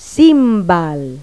címbalo; Pipo-Aprende musica con Pipo

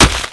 golpe hierba; Blade of Darkness

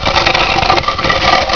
Open Poblado; Revista CD Expert 42; Grounch

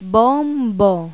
BOMBO; Pipo-Aprende musica con Pipo

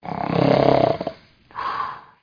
ronquido; efectos

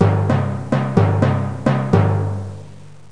TIMBAL; Pipo-Aprende musica con Pipo

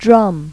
TAMBOR; Pipo-Aprende musica con Pipo

